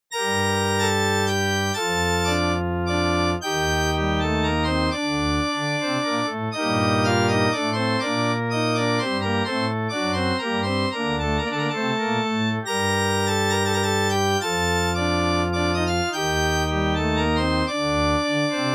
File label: Church Organ D, Minuetto in g minor, dry; Andreas Instrument Sounds AB